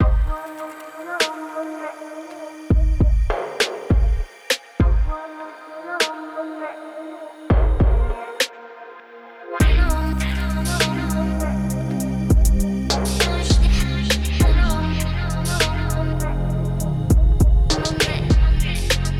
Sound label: kick mixed